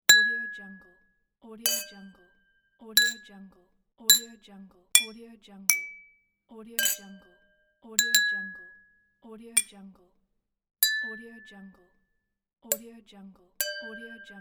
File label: glass clink